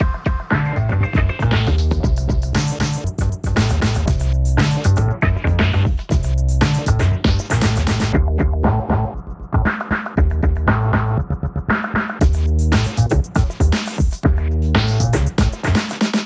90 hiphop 118bpm; hot lava; ios macos windows

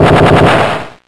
Dynamic Punch

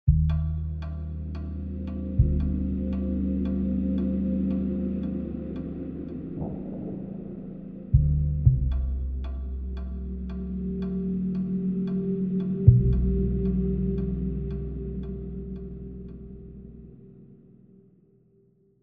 Tension

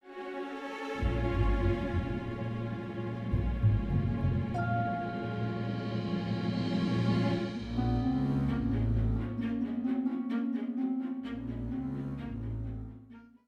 Music Future House; Costume Quest 2

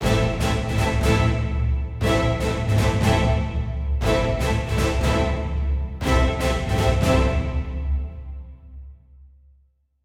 Heroic Start, soundtrack; atmasphere windows gamerip 2018

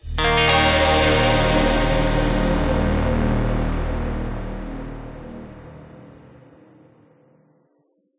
stinger neutral; fort onboarding

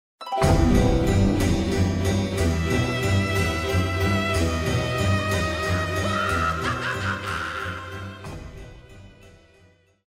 Combat Bgm Intro; MicroVolts Recharged

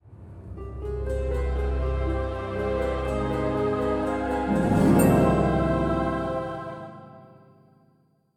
Jingle Monkey

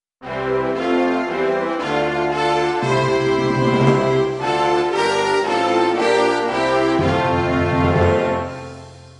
Anthem Nigeria; Buzz! The Mega Quiz; PS2

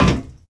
Footsteps; SFX Character

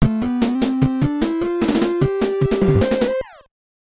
Extra Point Successful

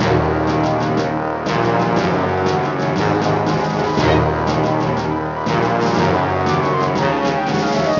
music

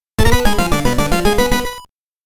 Heeelp! BGM; Esper Dream 1 2 Sound Memorial

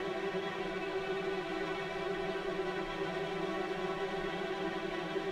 Suspence

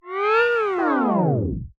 Tape Stop

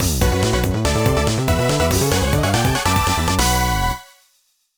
Jingle Win

